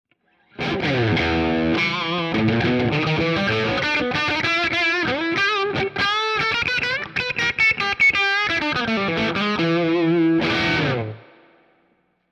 Cort SP90 Solo

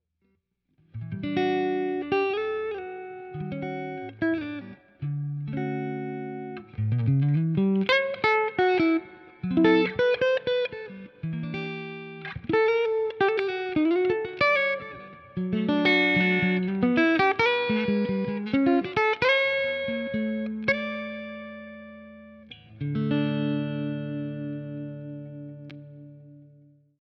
Cort SP90 clean

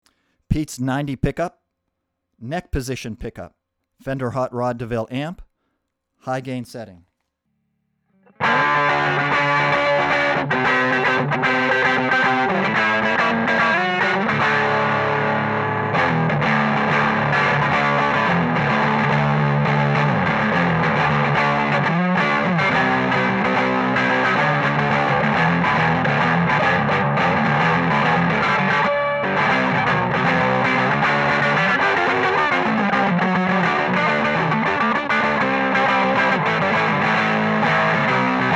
hot high, guitar

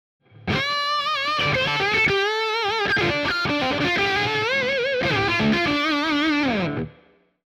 H540 Bridge Gain

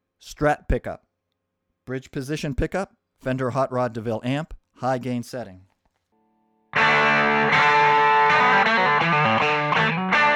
strat bridge hotrod high

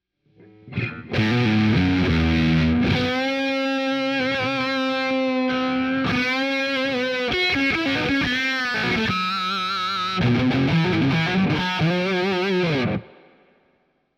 Strat Blade Solo

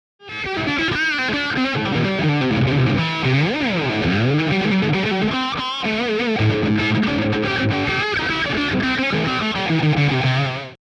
USA Tele, guitar

